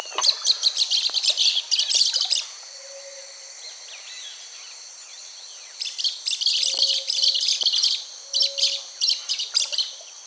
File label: Social Flycatcher